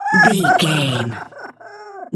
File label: goblin fairy laugh low